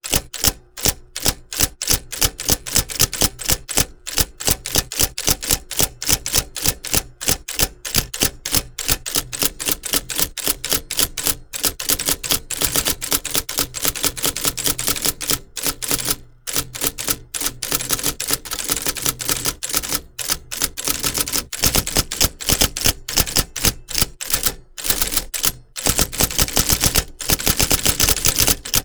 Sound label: Typewriter letter key long; rode; SkewSound; QWERTY